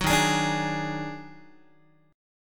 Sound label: Eb Minor Major 7th Double Flat 5th, Guitar